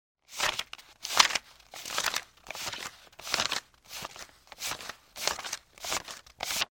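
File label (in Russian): медленно пересчитывают; Звук купюр, долларов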